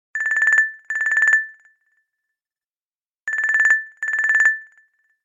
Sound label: Ringing Tone; Huawei